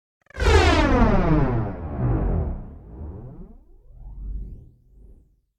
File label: Whoosh Fat Phased Going Down Long; Hollywood Edge; sound designer's toolkit v2